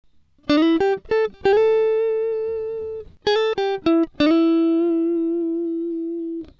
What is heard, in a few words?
slide